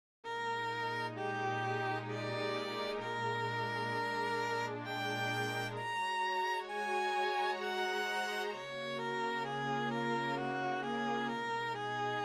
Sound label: violin p synth; dolcezza